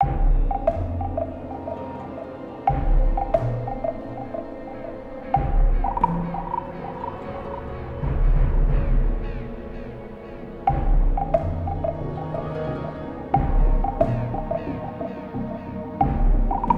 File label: forest night